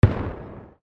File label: WEAPONS